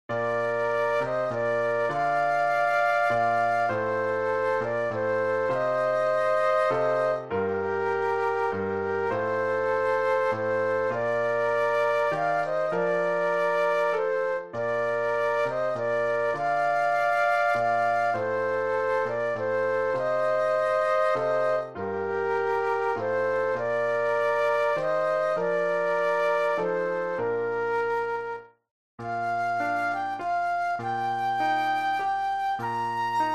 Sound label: Sonata Theme Flute Duet and Piano; classical; Mozart; K. 331